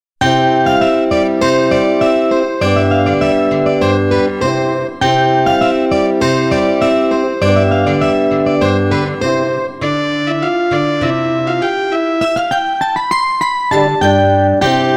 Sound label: Deck the Halls; Soundzabound Volume 2 Disc 1